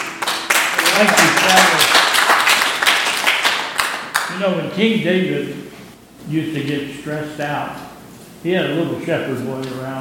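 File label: Applause and Comments, church service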